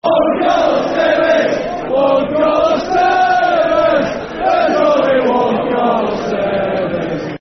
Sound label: One Carlos Tevez, Football Chants (Soccer Songs); Sheffield Wednesday Swfc